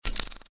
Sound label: spur shot